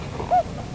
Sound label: loon hoot